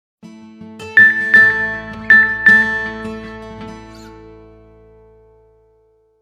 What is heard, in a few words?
Message; Nokia Ringtones; 6730